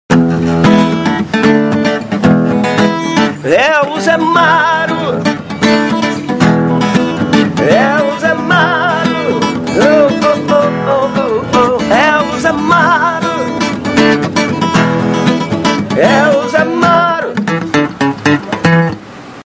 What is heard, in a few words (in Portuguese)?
Vinheta Cantada